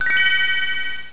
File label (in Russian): Zvon; ventafax5